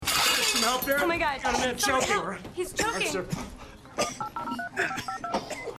touch tone

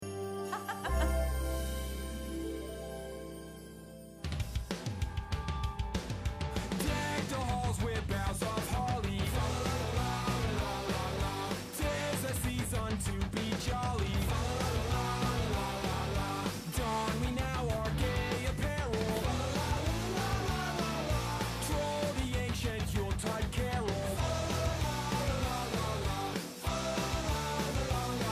pop punk; deck the halls

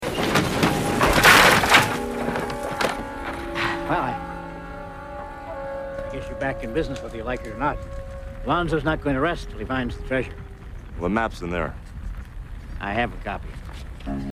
post explosion; hogan

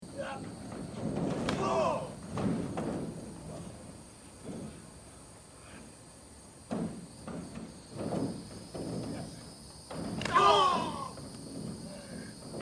thigh slaps; gargano vs ciampa one final beat

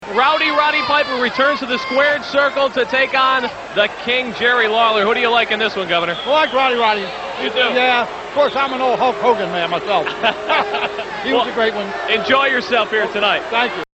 hogan fan

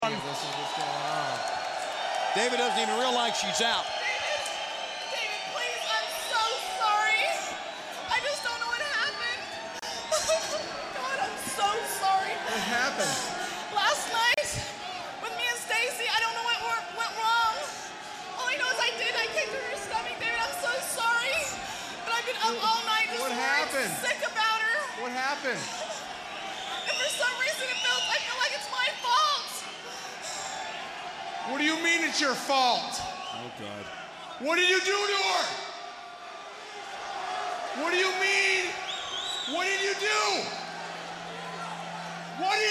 cries; Major Gunns; stacy keibler pregnancy

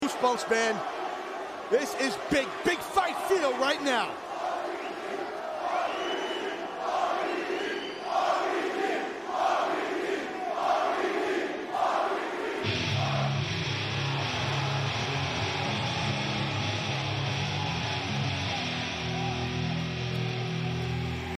chants; rvd; big show vs batista ecw

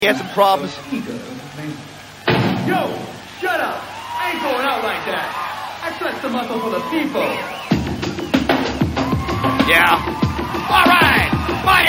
rap

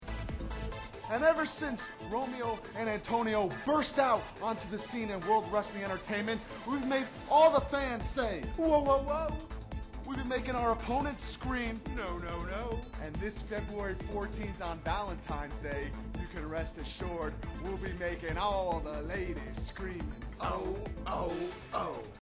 whoa whoa whoa; heart throbs

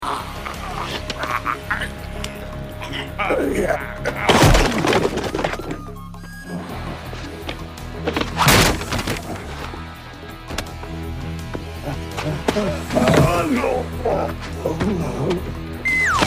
slide whistle fight scene

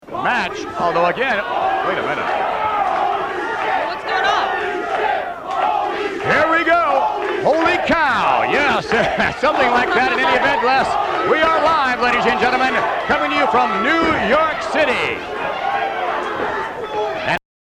holy cow chant

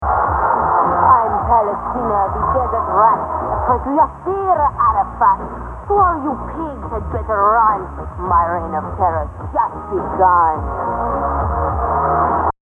rap

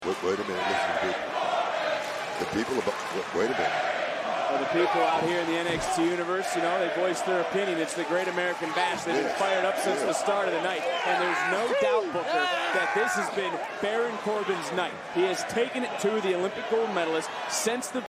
chant; baron corbin

